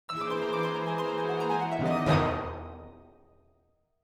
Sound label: game over screen music